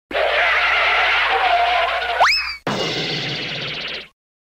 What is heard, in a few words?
Cartoon braking